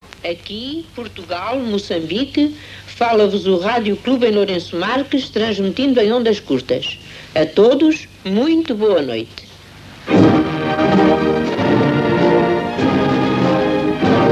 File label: Nat Anthem; early 60s